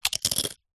eat bite crunch tortilla chip; human; zapsplat

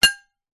impacts glass clink hit no break; zapsplat

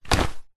sport wetsuit drop ground; zapsplat

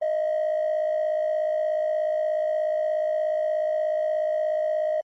analogue synth tone harsh dial tone data low

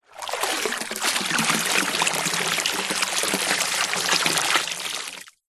nature water pour medium amount deep

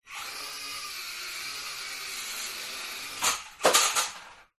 Drone Falcon; fox audio

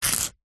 office brick pen ball point score mark

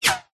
bullet pass by; zapsplat

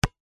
basket ball med bounce single on asphalt concrete; sport

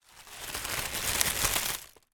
leaves plant dead dry scrunch movement; foley

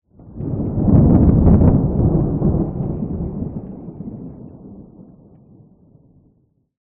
nature thunder distant rumble loud isolated

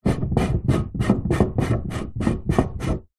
wood rail turn rotate on wood support; foley; zapsplat

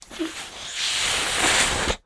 cartoon whoopee cushion blow up

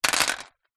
leisure toy bingo balls drop onto plastic rack; zapsplat

